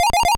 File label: sounds pause in